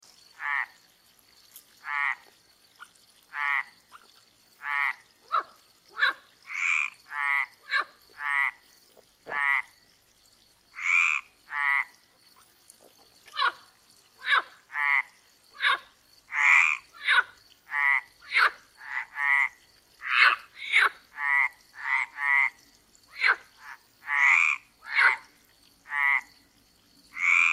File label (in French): Grenouille rieuse